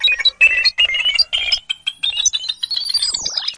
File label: beeps malfunction; Electro and Synthetic